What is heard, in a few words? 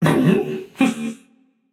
confused, voicepack; smite; jorm